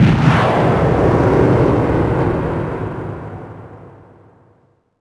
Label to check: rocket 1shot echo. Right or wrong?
Right